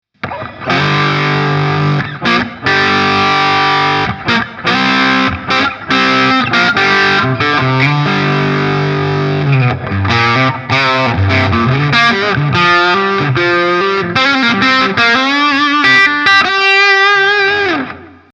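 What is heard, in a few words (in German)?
High Treble Normal PRS Steg; 4h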